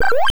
hearts end of level; castlevania

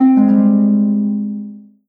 diplomatic offer denied; europa universalis iv

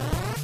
pickup; batman

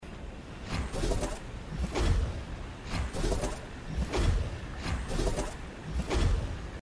strong attack; hyper light drifter

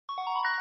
level up; Stardew Valley

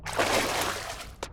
splash; gris

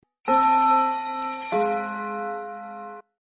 half way done; pikmin 3

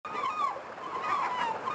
ambiance one; Super Street Fighter II

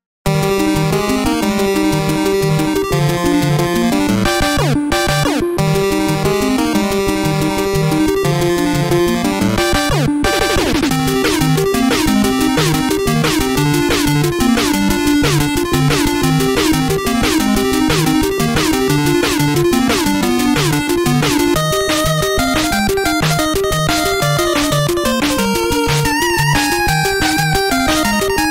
mmsoundtrack; Mega Man 2; gamesoundopedia

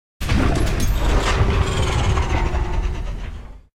terran variation; starcraft ii 2010

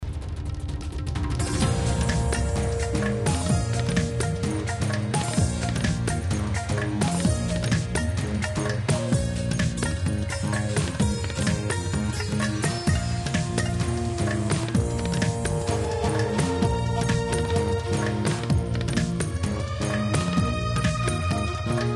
in game theme; temple run 2

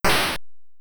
player use item pistol; 8 eyes

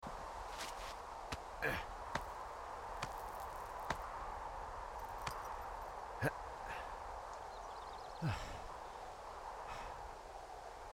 player climbing cliff; firewatch

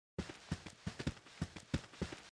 footsteps grass; baldursgate

